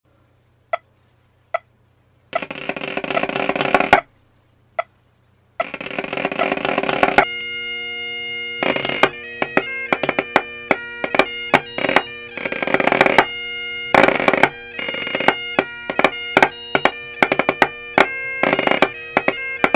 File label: Snare Drones